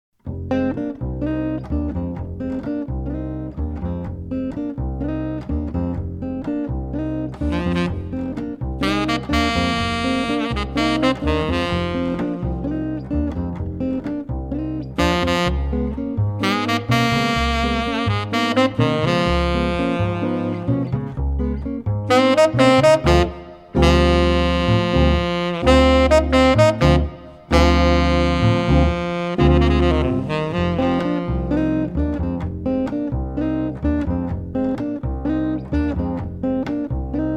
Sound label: ensemble, jazz ensembles